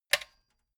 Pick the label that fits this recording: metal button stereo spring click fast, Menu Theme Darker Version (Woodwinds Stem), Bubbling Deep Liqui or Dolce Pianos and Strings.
metal button stereo spring click fast